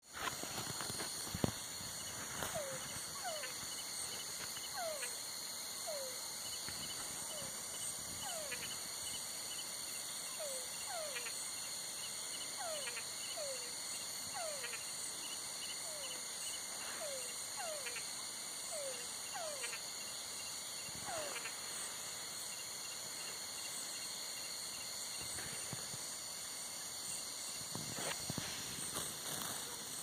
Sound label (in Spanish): Bio Fon A Noche Grillos Sapos